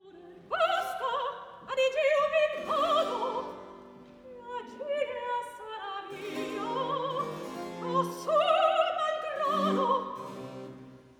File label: Recitativo; Handel; Teseo, HWV 9; Basta, ad Egeo men vado